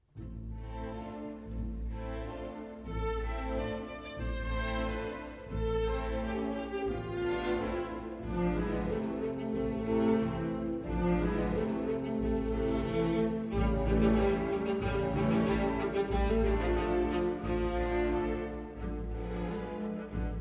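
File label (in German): souvenir de florence für Streichorchester d-moll op 70 allegretto; Allegretto Moderato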